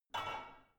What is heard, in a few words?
barbell only replace soft